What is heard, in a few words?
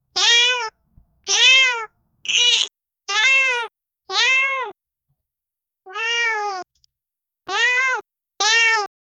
cat crying